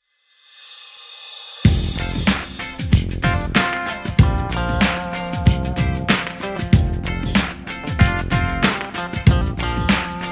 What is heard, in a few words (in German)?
trompetentaxi